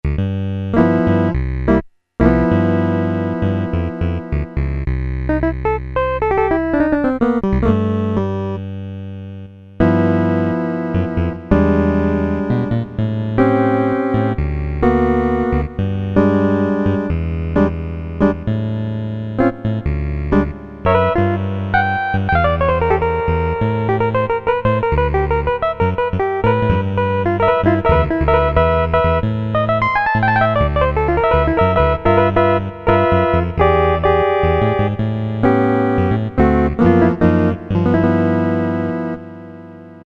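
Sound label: electric piano, Casio MT-68 preset sounds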